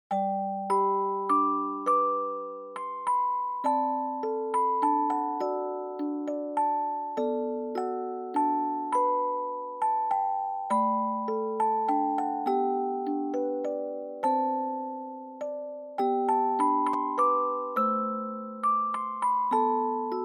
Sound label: Fuyugeshiki Dry Fast